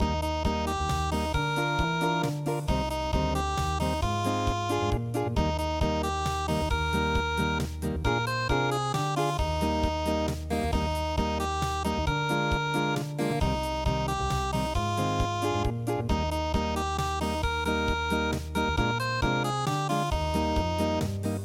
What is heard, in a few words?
unpluged